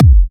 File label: Kicks; Vengeance Samples